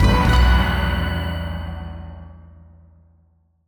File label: win small; netent